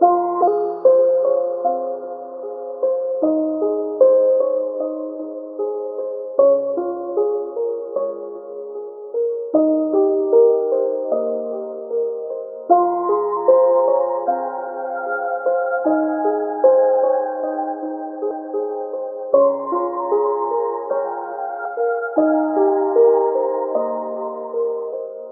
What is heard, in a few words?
piano sad face; Loops